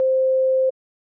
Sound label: sine